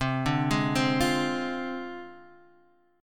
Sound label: D Minor 7th Flat 5th C, guitar chord